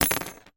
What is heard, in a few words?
large pin drop on metal surface